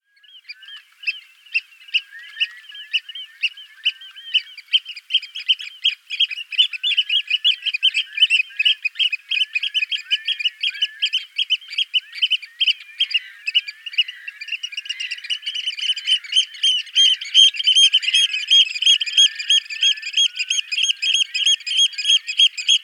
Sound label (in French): huitrier pie, chant